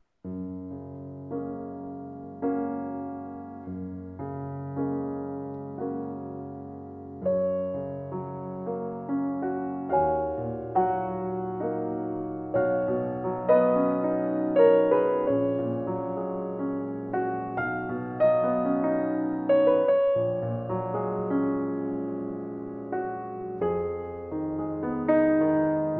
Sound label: am Steinway